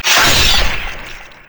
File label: crit attack; LoL; yasuo base